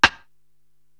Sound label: SD Old Ambi X Stick, Korg TR-Rack drum machine